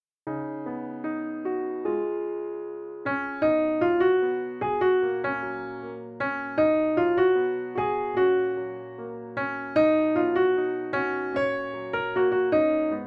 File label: Melody; FOLK